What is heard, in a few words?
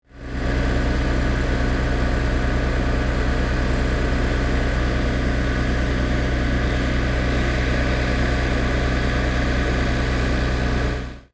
Wood Harvester